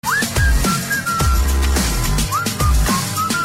Whistle

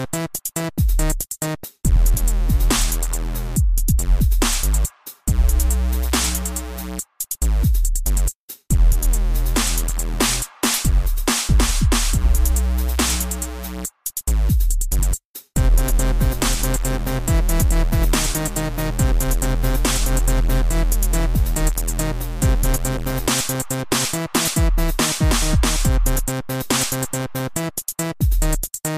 Apple Bass Mix

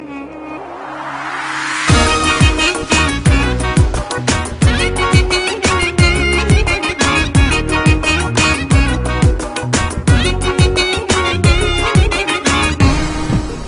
Duduk Zurna Armenin

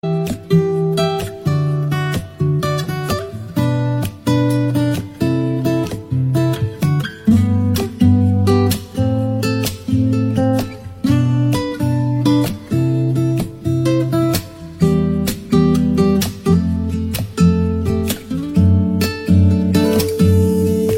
Te Amo Guitar

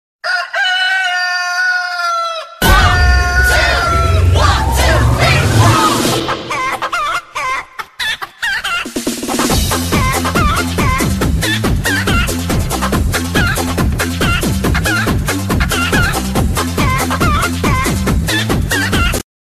Chicken Alarm

